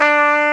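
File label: CORNET A4; AKAI S-Series CD-ROM Sound Library VOL-2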